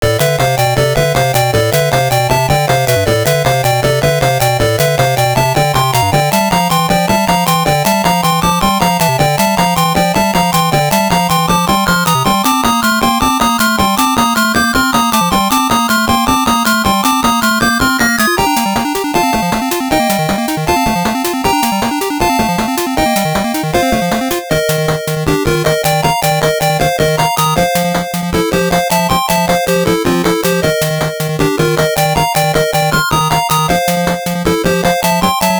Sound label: Stage BGM; delta warp; neo geo pocket color